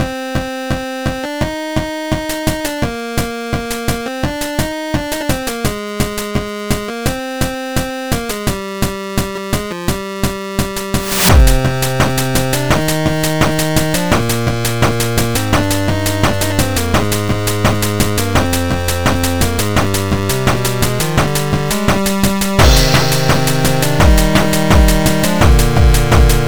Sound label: song